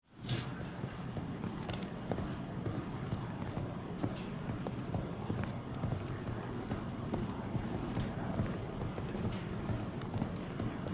Staircase Roll, Industry, Staircase, Roll, Across, Wood, Floor, Large, Metal, Staircase; Factory